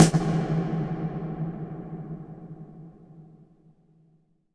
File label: IMP SNR DRUM, Drum, Cinematic Impact